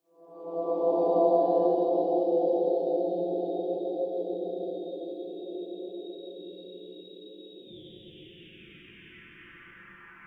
regen reverb

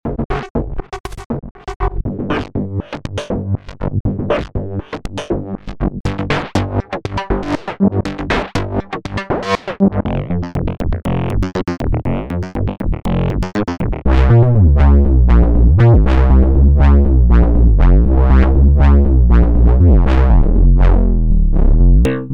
modulation loops

